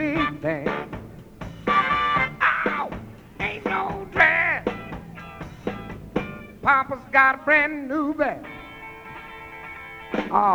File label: Live Bari Solo; Crawdad fest